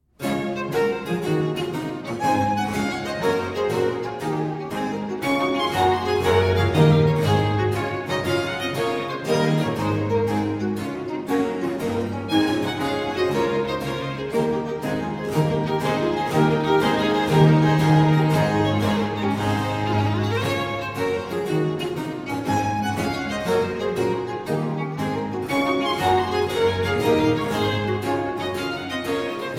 Gigue In D Major - Canon And Gigue For Three Violins And Basso Continuo; Johann Pachelbel